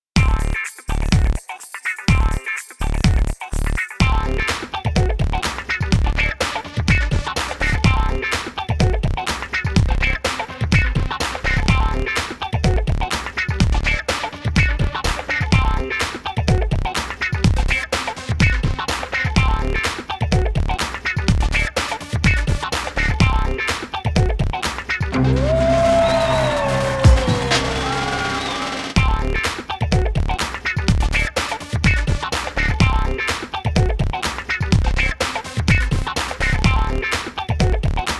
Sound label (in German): instrumental